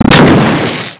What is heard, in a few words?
flechette explode; ministrider; npc